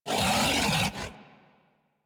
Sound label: Kubrow Feral Vocal Melee Attack; Warframe